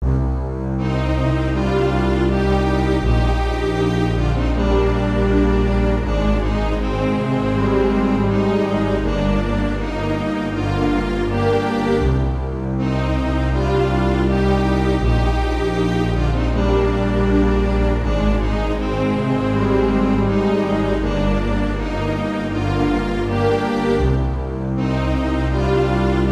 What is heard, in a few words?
Lose; dragon force